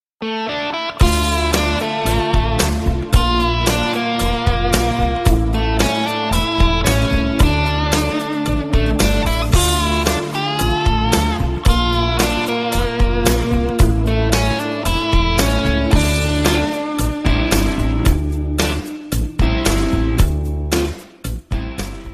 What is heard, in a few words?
tango instrumental